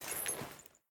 armor lite; smite; lancelot

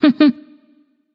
heh heh light; smite; voicepack; hel

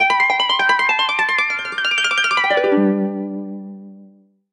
victory lyre; smite; Apollo

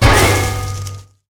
Impact; Smite; god Kumbhakarna

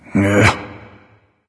"ugh"; smite; voicepack; ullr

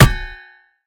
hammer hit; Smite; Bellona

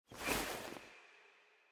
Clothes; smite; izanami

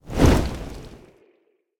idle flap, phoenix; smite

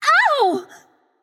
ouch light; smite; voicepack; hel

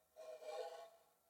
defeat spin; smite; kuzenbo

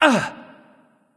grunt jump; smite; loki

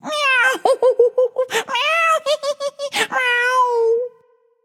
laugh, voicepack; smite; jorm

